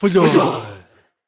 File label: Death Vocals; Candlehead